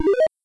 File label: get Gold; C64; LodeRunner TotalRecall